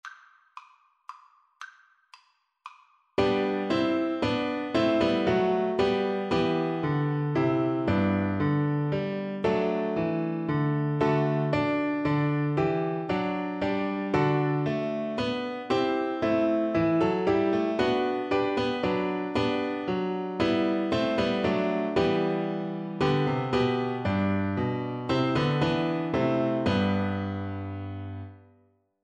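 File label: be thou my vision, marimba, Percussion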